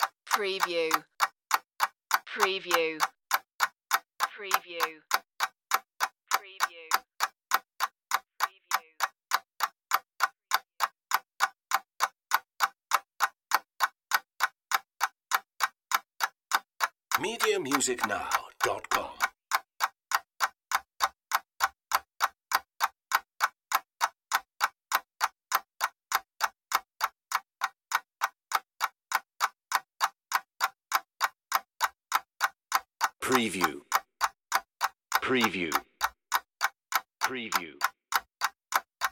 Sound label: clock ticking